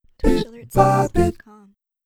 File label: Goofy Cheer